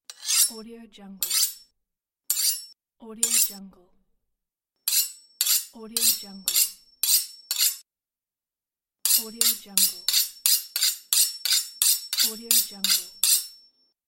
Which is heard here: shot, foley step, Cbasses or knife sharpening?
knife sharpening